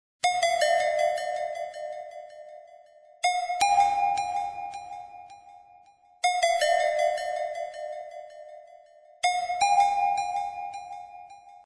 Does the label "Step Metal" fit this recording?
No